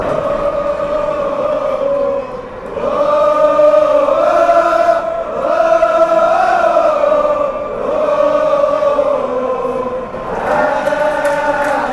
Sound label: ohhlalala, chants